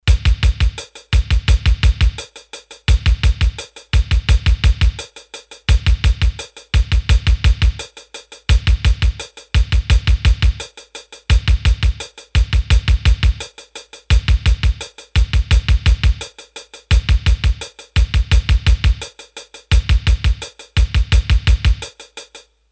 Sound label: Elec Pop, Roland D-10; Rhythm Demos